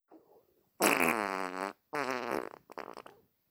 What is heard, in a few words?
fart